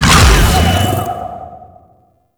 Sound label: Howl Attack; Warframe; Voruna